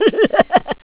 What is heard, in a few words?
laugh